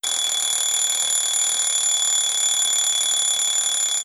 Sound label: alarm; EggTimer